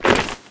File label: gear, metropolice; Garry's Mod; hl2